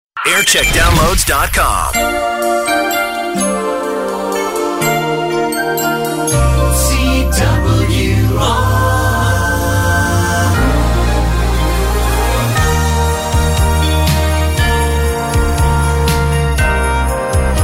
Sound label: Christmas Jingles; BBC CWR Coventry Warwickshire; JAM